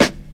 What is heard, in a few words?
Snare Drum A#, acoustic snare sound tuned to the A# note